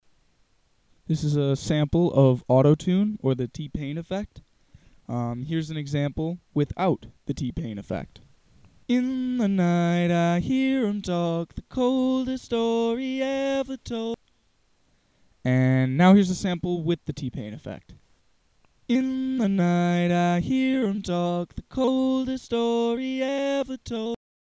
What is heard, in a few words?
Effect Sample